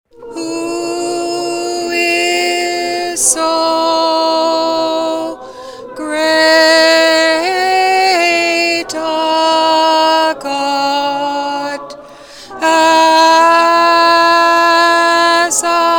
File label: choir with alto emphasis